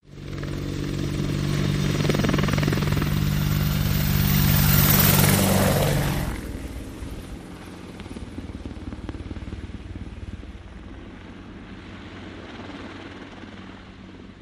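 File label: Apache Heli Pass Over; AH-64 Apache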